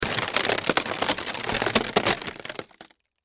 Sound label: Crash Wood Crack Splinter Split Ceiling; Cracking; Blastwave